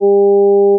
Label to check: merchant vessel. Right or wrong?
Wrong